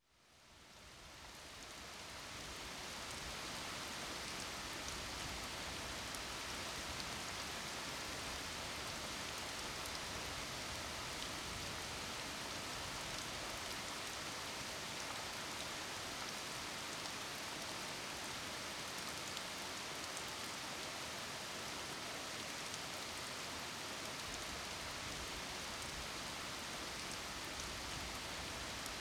rain & thunder; med rain; light thunder rumble & water drips